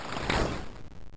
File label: bullet generic titan shield scatter; Titanfall 2